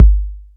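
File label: bass drum, Drum Machines; AcidLab Miami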